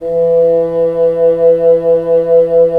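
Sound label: wind bassoon; LA Composer vol. 3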